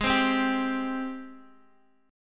G sharp Major 7th G sharp, Guitar DADGAD

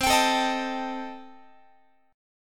C Minor 6th Guitar, chord; Cm6 strummed; x,20,22,19,19,20

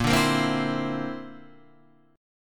D 7th Suspended 2nd Sharp 5th A sharp

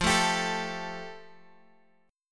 F Major Add 9th Guitar Open F; Fadd9; 17,16,19,16,x,x; strummed

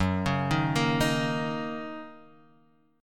F sharp 6th, chord; x,x,4,6,3,5,5,x

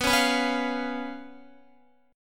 B Suspended 2nd Flat 5th B down Guitar; strummed; JGuitar

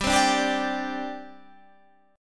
G Suspended 4th, Guitar, Standard; Gsus4 strummed; JGuitar

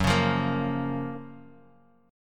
F sharp Major 7th F sharp, Guitar Open D; chordshape; down

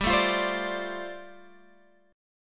G 7th Suspended 2nd Suspended 4th, Guitar Open F

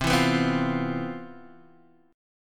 C Major 11th C; CM11 strummed; JGuitar

